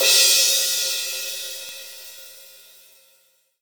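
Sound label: cymbal crash; Best Service Gigapack 2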